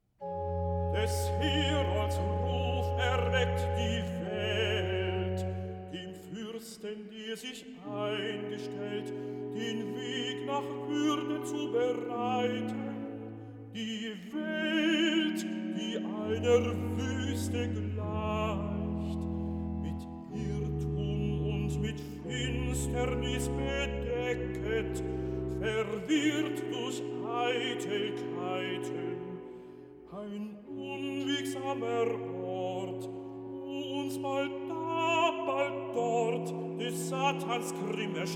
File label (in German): Recitativo (T); Homilius; Des Herolds Ruf erweckt die Welt